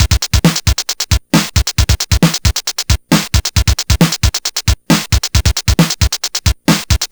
Game Boy Break Loop, 8-Bit, Drums

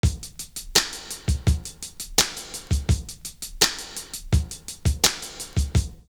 STONE SNARE; Univers Sons; 84 LOOP

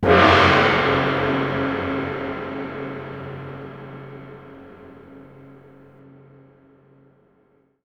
long gong; Sonic Foundry (Sony Creative Software); Ugly Remnants VOL-2; Beatstuff

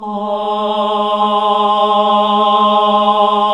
HAL AAH, Nuns Aahhh; Sound & Vision - Gigapack II CD 1 (Roland); HAL NUNS AAH OOH

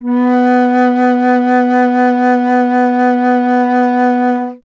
Alto Flute, Solo Instruments

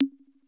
PLOP, DRUMS; Music Store Sound Service; Einstein

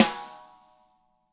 DW rim; ILIO

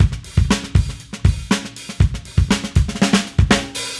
Drums Fill, 70s Analog Funk; Sonic Foundry (Sony Creative Software); What it Is! 70s Analog Funk

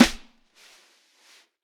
Vintage Snare Drum; Q Up Arts; Johnny.C's Vintage Snare Drum; 4X14 JCBOB

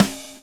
groove; AMG Gota Yashiki Groove Activator

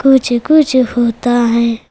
CHILD, Indian Traditions

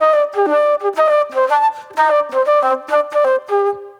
Soul Plate Flute

